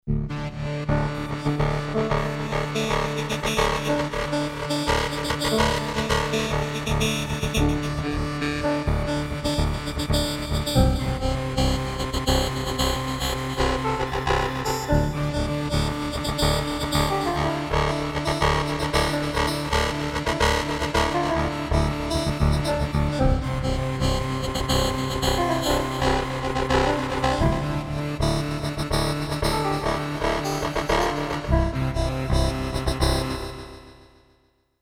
vector harsh, Yamaha TG33

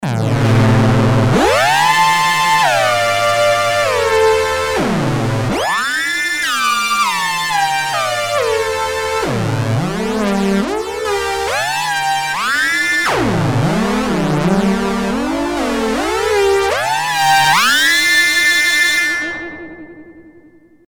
Duo bass station 1 & 2; analog monophonic machine with subtractive synthesis

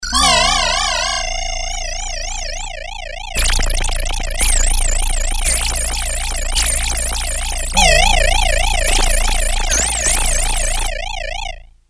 yamaha tx81z

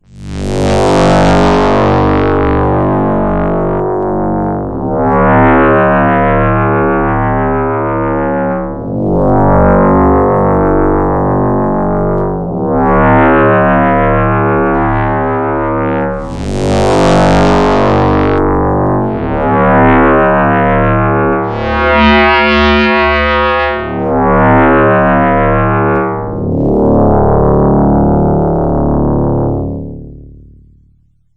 pad, yamaha tx81z; dune

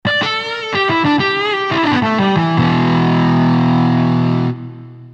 guitar electric, Roland JV2080

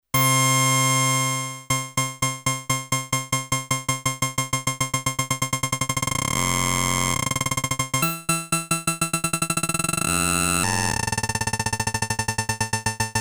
eg repeat, Polivoks formanta

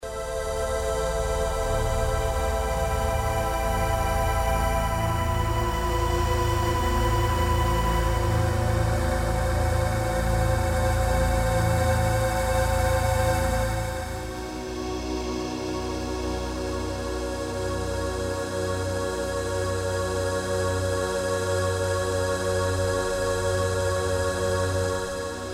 yamaha tg33

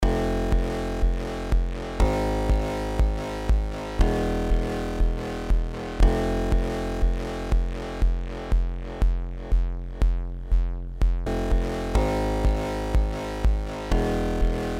pulse digital, yamaha tg33

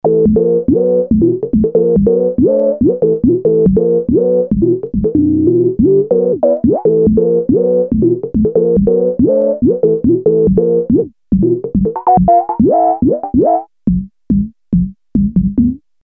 synth rez, Moog Minitaur

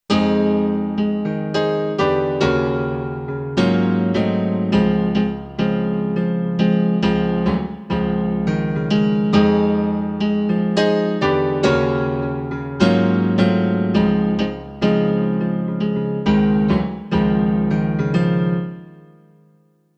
korg m1r synth; piano: pianobar; cpu NEC-V50 (part of 70216 family) manages 16 voices polyphony

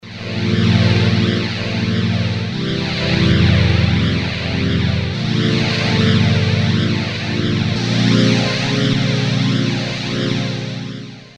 pad chorused, synth Roland alpha juno

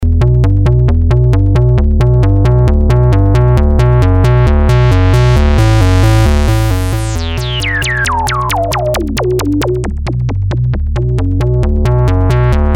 bass french, Creamware minimax

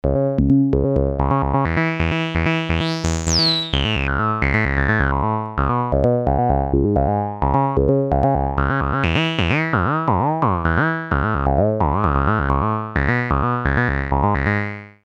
squelch, nanozwerg; MFB nanozwerg

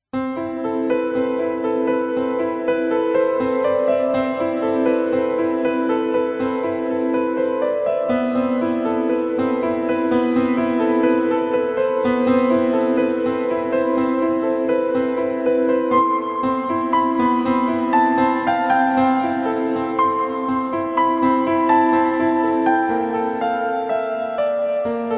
pianos, Roland XP50; synth

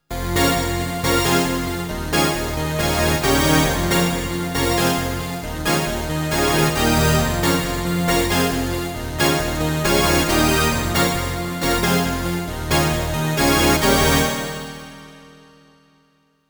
SYNTH stereo drive, Roland JD800; SYNTH 90 sound

